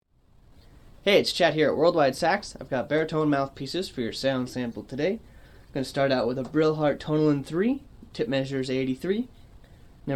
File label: Bari MPCs, sax; Tonalin; Martin; Buescher